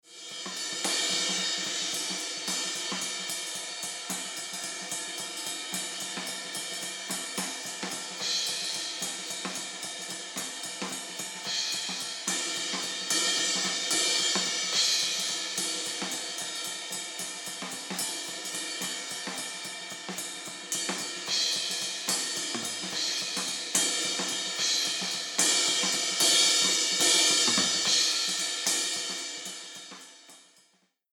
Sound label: Mix Drums HH Ride Ride EQ On